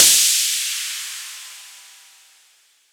Ride

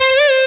bend full release

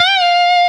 bend full release Left-hand vibrato

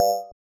BELL; osu

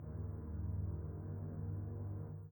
chord

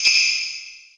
suzu

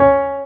Synth; osu

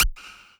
lead

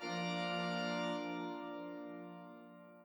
chord sa f a p d f a p d

